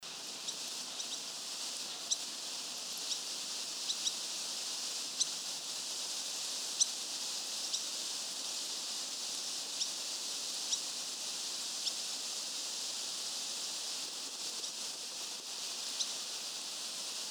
Madagascar pratincole; پرنده گلاریول ماداگاسکار

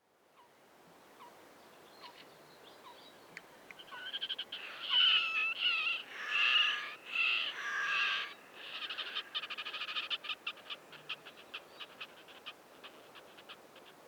Red necked grebe; كشیم گردن سرخ

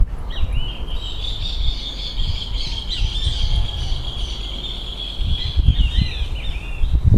rufous hornero

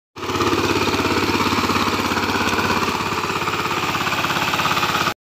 Agricultural tiller

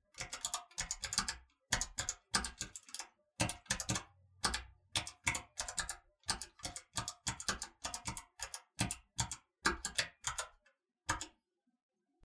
slow typing mechanical keyboard

